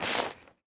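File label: foliage footstep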